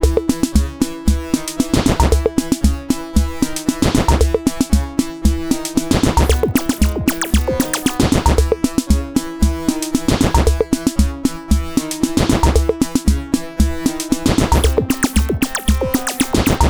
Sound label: marching cartoon loop